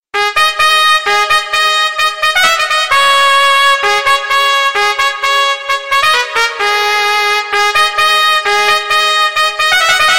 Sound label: music